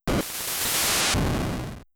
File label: Blast off then boom; mother1